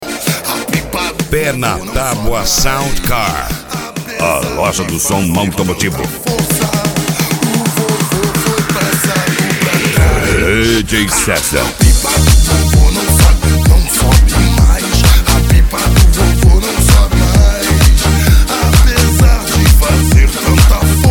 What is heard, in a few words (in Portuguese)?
sound car carnaval